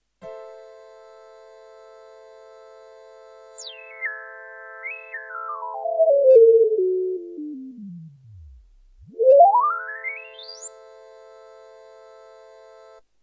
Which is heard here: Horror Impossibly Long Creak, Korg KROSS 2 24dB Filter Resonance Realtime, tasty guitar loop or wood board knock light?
Korg KROSS 2 24dB Filter Resonance Realtime